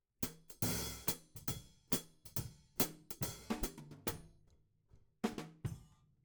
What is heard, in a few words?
Hat